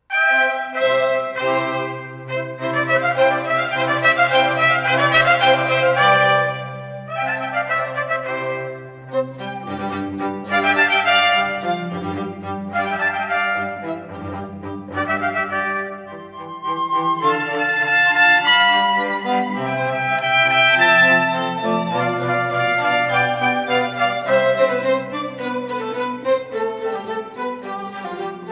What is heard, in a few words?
Concerto for Two Trumpets String Quartet 2 Trumpets; Vivaldi; Classical